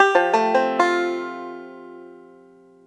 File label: banjo arp F1; AKAI S-Series CD-ROM Sound Library VOL-2